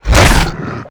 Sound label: pinky attack melee, monsters vocalizations